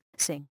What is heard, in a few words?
sing